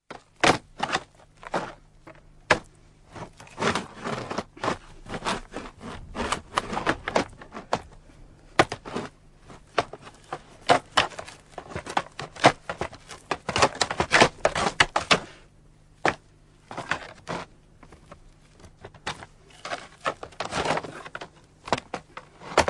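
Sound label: Butting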